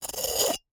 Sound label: Jar, terracotta, ceramic, lid, rubbing, scraping, grating, rough, ragged, swoop; Eiravaein Works; Vaeyan IV